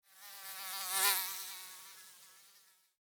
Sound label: Mosquito Fly By, Mosquito, Fly, By, Wings, Insect, Bug; Mosquitoes; Animals:Insects; Sound Effects:Animals:Insects; Blastwave